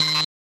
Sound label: code puzzle error